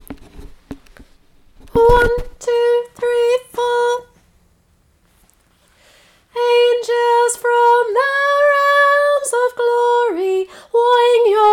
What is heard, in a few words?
angels sop